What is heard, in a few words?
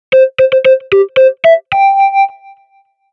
notification melody